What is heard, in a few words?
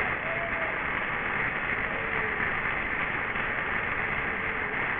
aplause